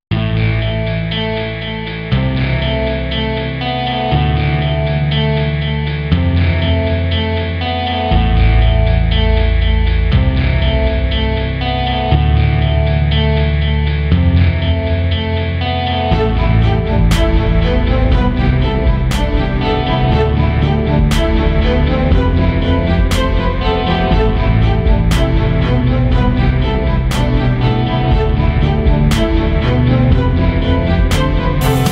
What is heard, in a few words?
Epic Powerful Hybrid Orchestral Action